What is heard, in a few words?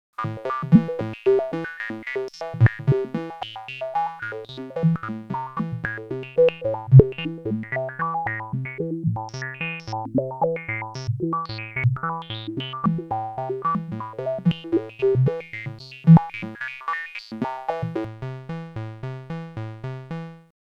Spatial Delivery Synth Arp Sample and Hold; Earthquaker Dievices